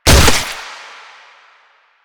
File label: Infested Ancient Footstep; Enemies; Proto Infested Ancient; Warframe; Ancient Ninety Nine